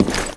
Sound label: player metal